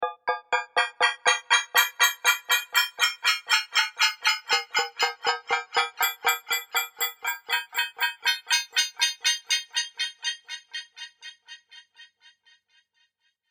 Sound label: Choppy glassy transition; High Tones; Sound Design